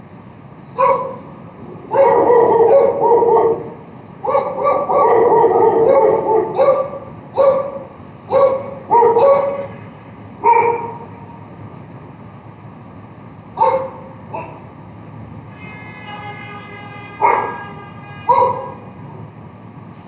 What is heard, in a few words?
bark samples